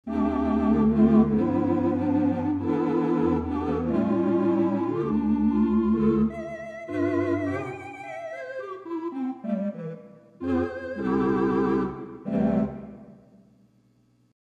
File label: 8 Tibia 8' String 2 2-3 Tibia, theatre organ; This can be used where you want a registration featuring a S